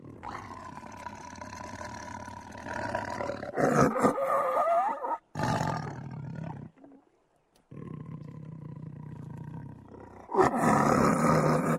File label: Tiger Snarl